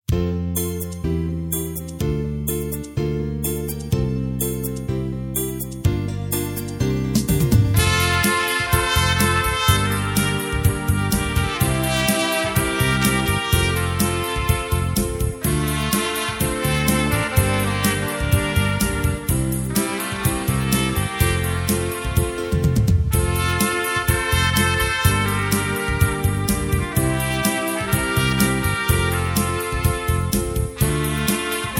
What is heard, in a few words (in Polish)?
jest takie serce instr